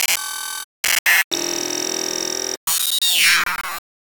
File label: glitch error malfunction; sonniss gdc